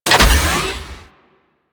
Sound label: Rescue Tube Open; Ambience; Warframe; Corpus Ship Remaster